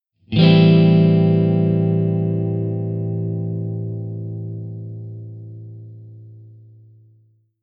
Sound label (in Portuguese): Acorde de Tetrade de Am7